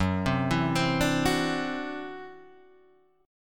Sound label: E 7th Suspended 2nd Sharp 5th Gb, guitar